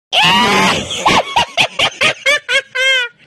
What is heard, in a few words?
witch laugh